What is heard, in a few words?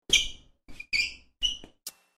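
sneakers squeak on floor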